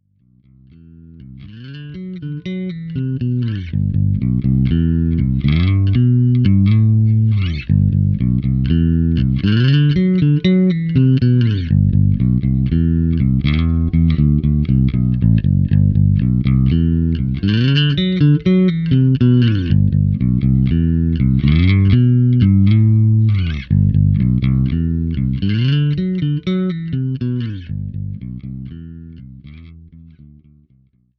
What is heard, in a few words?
bassman style